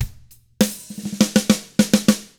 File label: ROCK, Drums